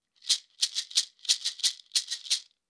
Maracas prise bouteille

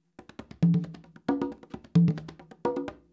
roulement, congas

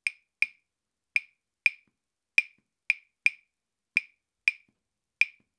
clave de rumba 2+3

